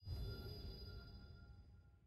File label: sfx nav button play hover; postgame